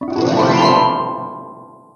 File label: magic wand